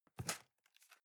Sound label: cardboard box close fold single; objekte